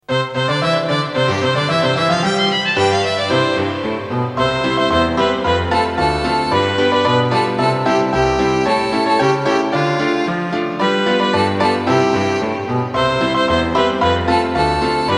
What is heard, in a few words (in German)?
zirkuslieder die zirkusmaus playback